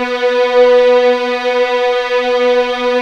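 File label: B3 legato F R, string; East West; The Ultimate String Collection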